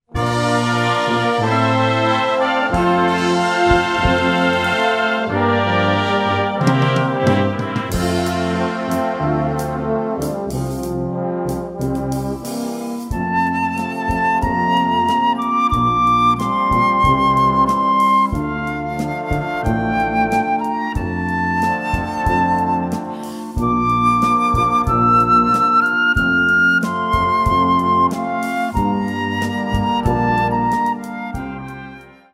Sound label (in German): Ballade fuer Floete